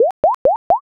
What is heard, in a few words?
bubble